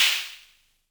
Snare, Univox SR-95

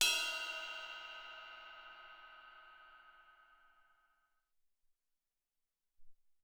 Bell Ride, Alesis SR-18

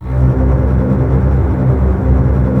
C 2, Basses Tremolo, String Ensembles; Miroslav Vitous; 90 s Sample CDs

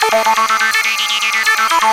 Tech, Keyboards

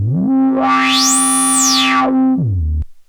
Monotron Sci-Fi

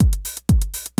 MOST BASIC, breakbeats; Crypton - DrumBase5000; BREAKBEATS#2

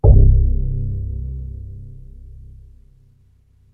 BASS SWEEP, MORPHEUS; Syntec; Wall of Sounds

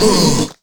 VOCALS; Ueberschall; Jam Box

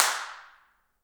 handclaps, Heart of Africa

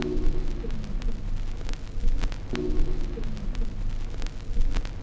Crackle Loop, Vinyl Noise

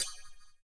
CYM, Ethno Techno; ILIO - Ethno Techno

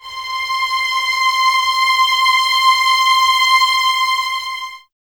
VS C 6, 11 Violins, Top; Miroslav Vitous; String Ensembles

